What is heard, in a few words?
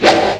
DRUMS; Rubber Chicken Software Sample Bank